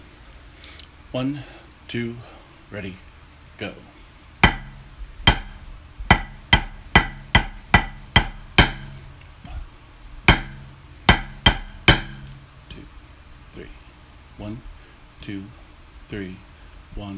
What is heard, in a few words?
Tenor; KOMC Competition Scores; Campsie Hills